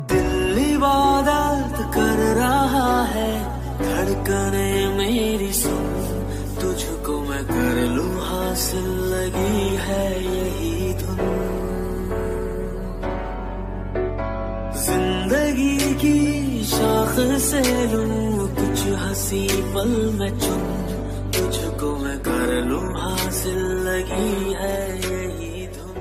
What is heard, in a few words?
beautiful bollywood song ringtone